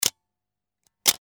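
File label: camera click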